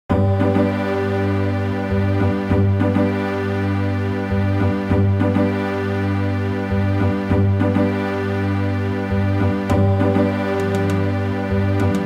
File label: soundscape; old runescape soundtrack; osrs